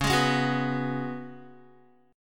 C sharp 7th Suspended 4th C sharp, Guitar; C#7sus4; strummed; tuning=4F,4A,5C,5G,5C,6E; guitar chord

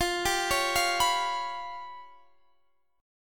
G 7th Flat 5th

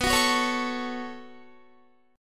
B 7th Suspended 2nd, Guitar; B7sus2; strummed

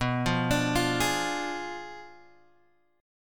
B Diminished 7th B down Guitar Open F; Bdim7 arpeggiated

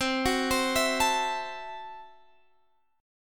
C 6th Flat 5th, C, down, Guitar, Standard; arpeggiated; JGuitar

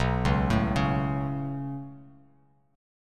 B 7th Suspended 2nd, Bass Standard; B7sus2; arpeggiated; JGuitar

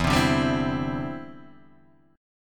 E Minor 7th Sharp 5th E, Guitar Half Step Down

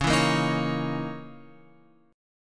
C sharp Minor 6th Add 9th; C#m6add9 Chord {9 7 8 8 X 9} strummed

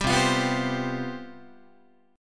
C Major 7th Suspended 4th, C, down, Guitar

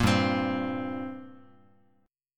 G Minor Double Flat 5th, G, Guitar; strummed; JGuitar

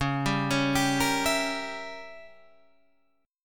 Db Diminished 7th Db down Guitar Open G

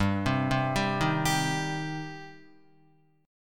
G Suspended 4th Sharp 5th G down Guitar; Gsus4#5; arpeggiated; JGuitar

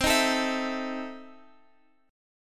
E Major 7th Suspended 4th Sharp 5th, C

